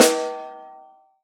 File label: RAW SD, Drums Acoustic; USB Soundscan vol.10; AKAI; 90s sample CD; ALL SD